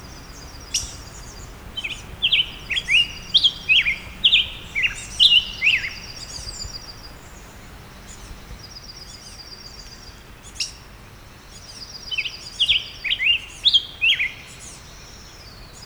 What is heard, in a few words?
Black-headed Grosbeak, Pheucticus melanocephalus; cardinals tanagers grosbeaks